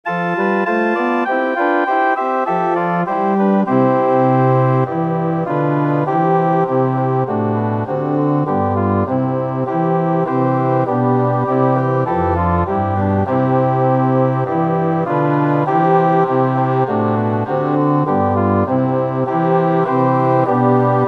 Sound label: Bassoon Track with Melody